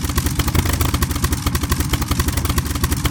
Aircrafts Engines Rolls Royce Merlin Exhaust Start; DCS